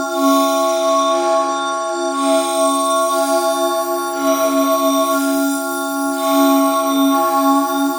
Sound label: Synth 120 Dbm